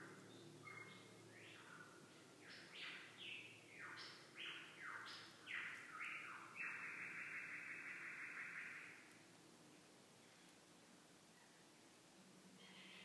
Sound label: lyrebird